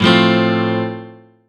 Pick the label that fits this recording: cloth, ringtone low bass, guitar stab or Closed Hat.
guitar stab